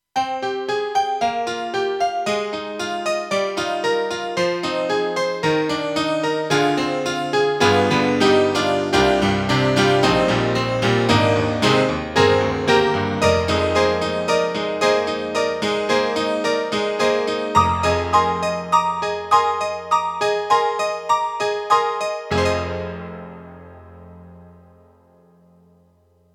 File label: AKAI SG01k Honky-tonk